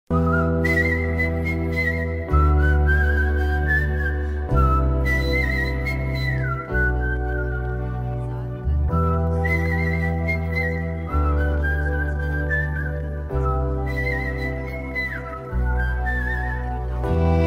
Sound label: instrumental ringtone bgm; animal